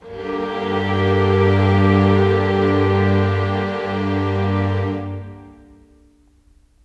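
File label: Pad G minor; Sonic Foundry (Sony Creative Software); Orchestral Series VOL-4 - Rock and Pop; Pads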